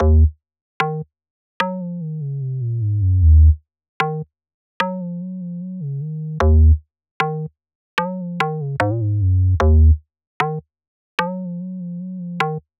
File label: Hard Tech Bass